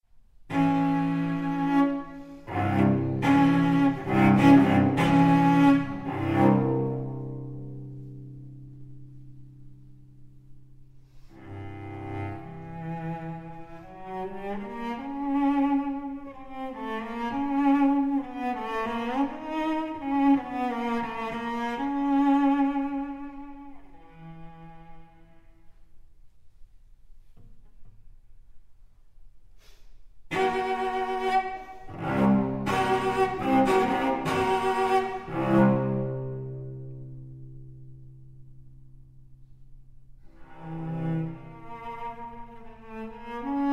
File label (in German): Cellosonate